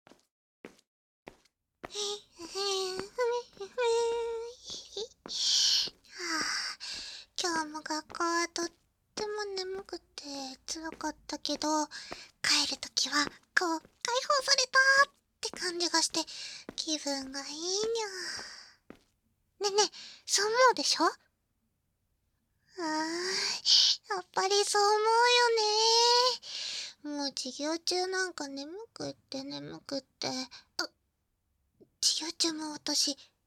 耳かき添い寝, ASMR; ヤンデレ猫幼馴染

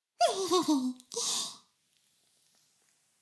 耳舐め音声; 古き城のドラゴニート2

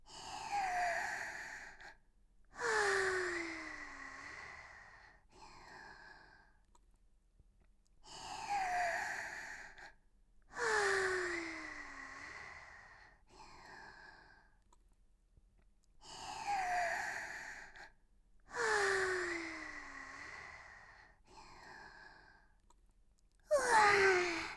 息合わせ（深呼吸 or 寝息）

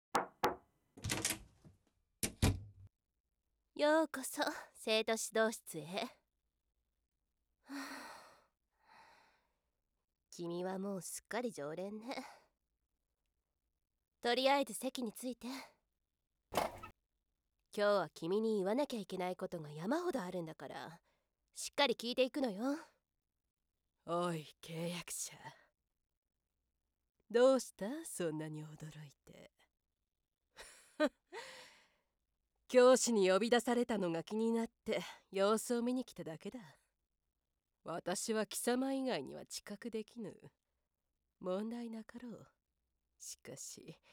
冒頭玉舐め; 生徒指導室; 一人二役

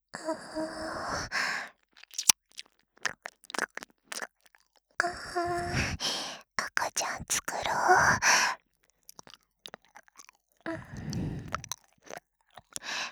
耳舐め 喘ぎ, 左, バイノーラル; 赤ちゃん作ろ♪; ハーレムオナサポ 4人のメイドがご主人様のオナニーをお手伝いするバイノーラル～文、千曜、名月、莉桜～

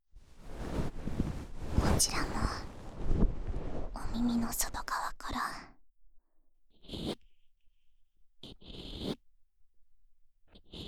左耳かき, ASMR

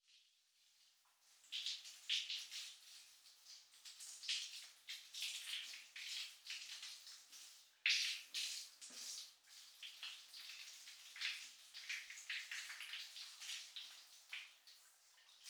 シャワー; お風呂系

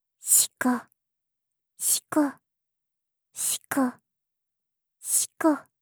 シコシコ オンリー ゆっくり; オナナビ4 ～ゆかりのオナニーナビゲーション～

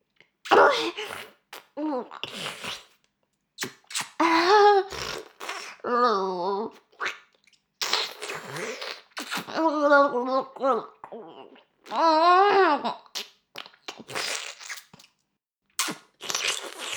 フェラ音声; 少尉; 牧場戦腺

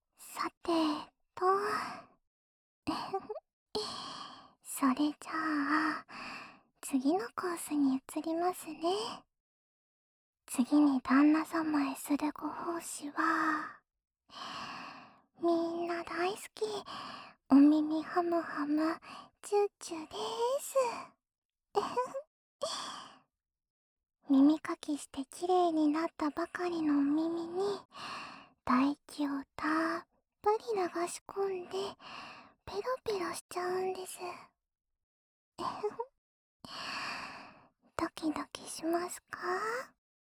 お耳をちゅっちゅ; ２人同時に、旦那様のお耳をちゅっちゅしちゃいますね♪

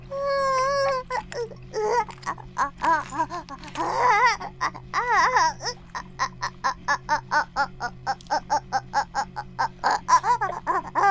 バイブ放置, ASMR

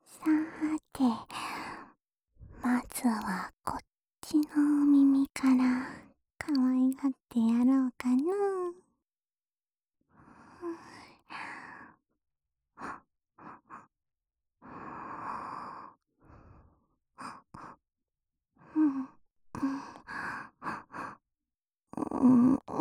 耳の奥まで たっぷり 耳舐め 責め （右耳）

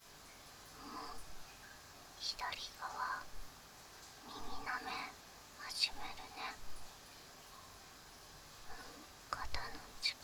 左耳奥舐めマッサージ, ASMR

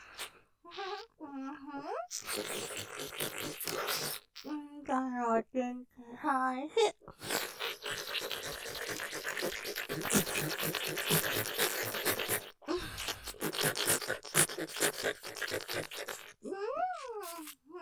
舐め 気持ちいい?おちんぽ美味しい♪; ステレオ

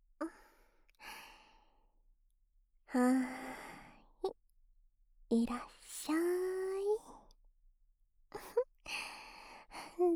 お姉ちゃんの耳かきタイム, ASMR; 萌深お姉ちゃんの耳かきタイム♪; 効果音なし

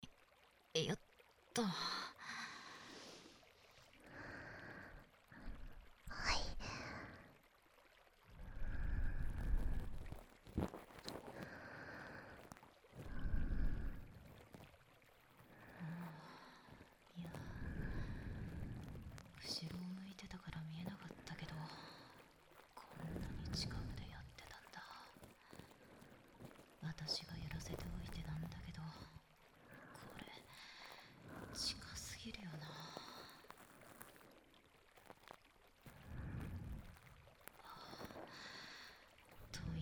左耳, 耳かき; 博士がチョコレートを食べて酔払っちゃうお話; 手…握ってもらっていい?

